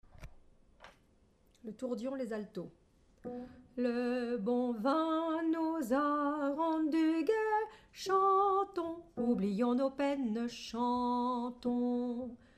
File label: Alto; tourdion